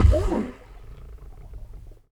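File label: motor burst, Underwater Drill; SFX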